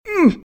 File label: hurt; RadwinIsland